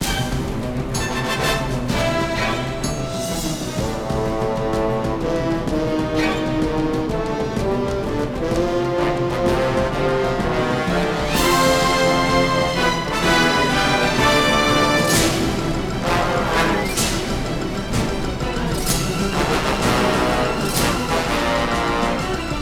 Combat; The Hobbit; PS2

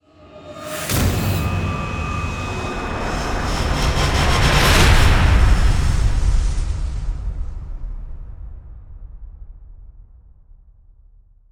Stinger Modulate ramp; extinction deluxe edition; ps4 windows xbox one

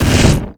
Frame wall Hit; Se Gimmick Framewall Hit

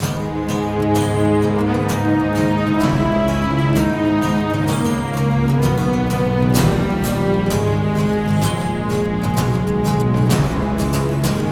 Combat; The Hobbit; PS2

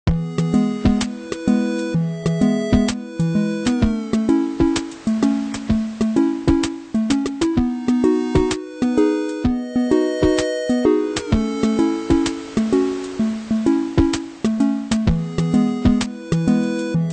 beachland; super stickman golf 3

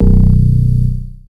Bass

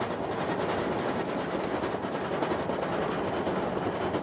Minecart Loop

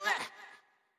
Attack; Wimp

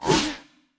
Dash; Caffiend

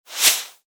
pannel in

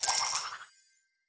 Score Increase; End Run Screen Score Increase; Subway Surfers City; Android, iOS

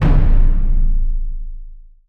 Fanfares, roll end; mk9

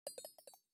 Footstep Stinger; StyxMech

